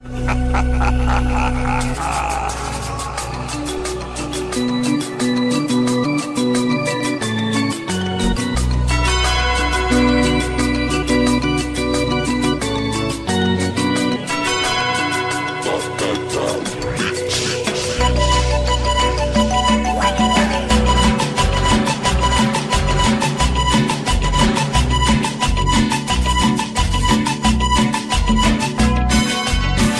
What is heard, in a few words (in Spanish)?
Danza Colombiana